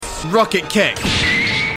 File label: rocket kick